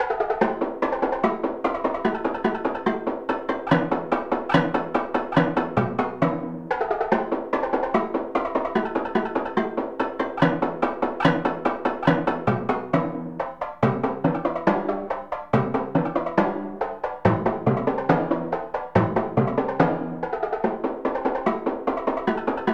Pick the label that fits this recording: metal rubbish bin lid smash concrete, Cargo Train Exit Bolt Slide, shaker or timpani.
timpani